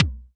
SC40 BD, Pearl SC-40 Kicks; drum machines

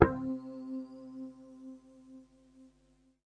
harmonics, Guitars

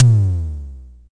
Simmons SD-5, drum machines

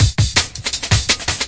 163 stonewashed, drum loop; 161 to 180 bpm

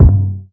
kick, classic hip hop

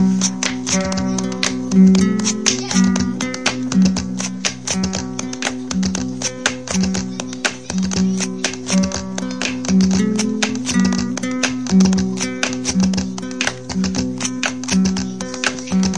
120 guitar percussion; mixdown; remixable collections; Folksy Guitar and Voice Stems

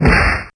lofi karate kick; Kung Fu; Kung Fu Construction Kit

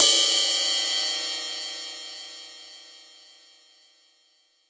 rs7000 hh cy ab5, Yamaha RS7000 Cymbals; drum machines

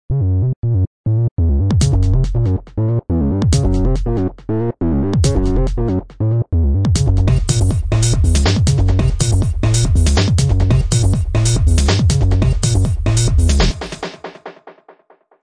140 bass and tekgroove; SYNTH AND ELECTRONIC LOOPS

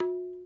Na, Tabla; Tabla Kit Large

